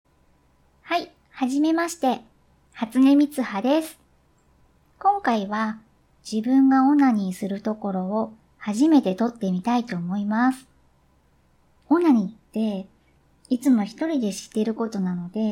初ガチ実演オナニー; 恥ずかしいけど…手止まらないの…最初は元気に話していたが、オナニーが気持ち良すぎて我慢できずに…

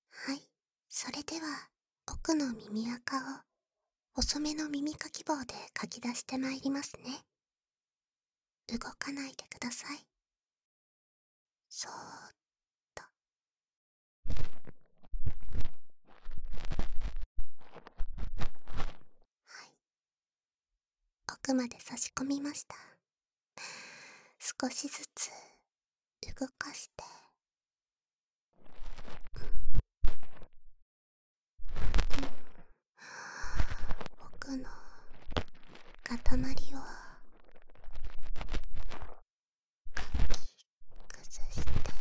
【左】奥耳かき; 妹の友達の大和撫子メスガキのやんごとなき耳ほじりで俺は敗ける。

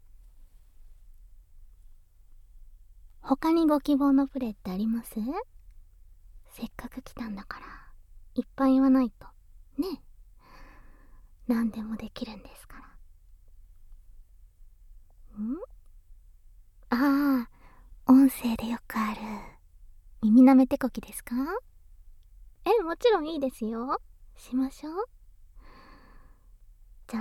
耳舐め; 耳舐め唾液手コキ; あだると放送局

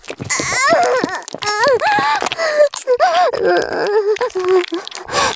絶頂 中出し, ASMR; おまけ(絶頂+潮吹き+中出し ランダム再生)

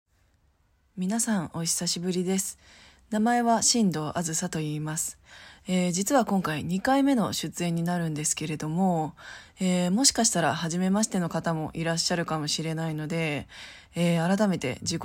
吸引バイブ 実演オナニー; 吸引バイブでイキ狂う; 【実演オナニーRTA】声優による実演リアルタイムアタックオナニーはどれだけシコいのか！？

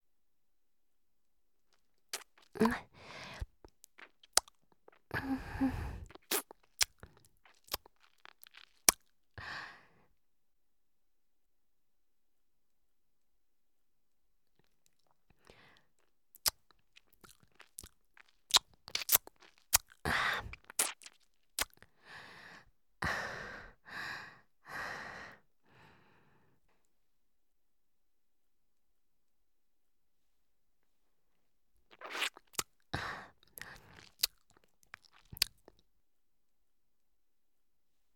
キス音

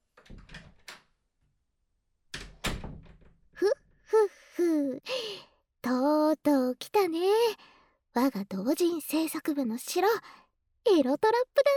両耳舐め, ASMR; エロトラップダンジョン

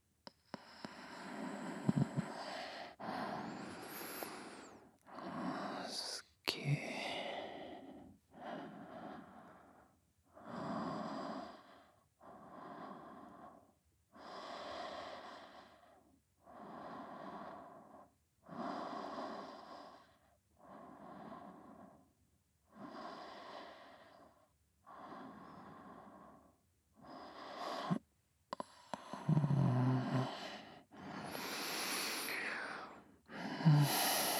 寝息 ボイス; ラブラブヒーリングエッチで恋人を救います〜闇魔法を浴びた魔法少女を目覚めさせるために好き好き快楽エッチ〜

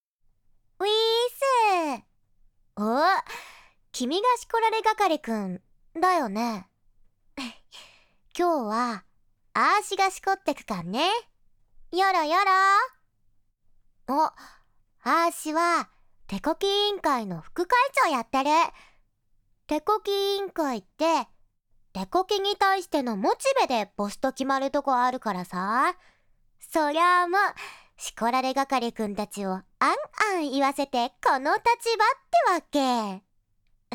手コキ ギャルでエロい耳舐め; 手コキ委員会; 副会長さん

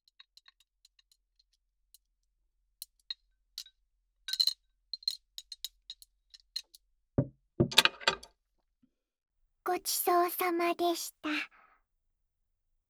膝枕 耳かき; 鈴音も耳かき、したい; 添い寝・耳かき・調理音; 隣に住む妹みたいな子とお泊まり; ユメミドリーマー

